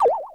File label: Monty Mole Hit Wall; New Super Mario Bros. Wii